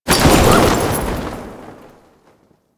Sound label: Paratrooper Balloon Drop Off; Enemies; Soldiers; Warframe; Lasrian